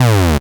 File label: Synth Cuts; Vengeance Samples; VES1 Instruments